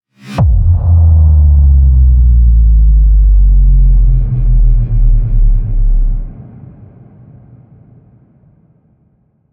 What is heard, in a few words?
Intro cinematic sound; Star Shelter